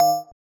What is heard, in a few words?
BELL